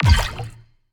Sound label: batons heal